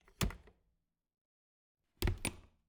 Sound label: Refridgerator, old fashioned, wooden, steel, brass clasps, hinges, close open, soft; Eiravaein Works; Vaeyan IV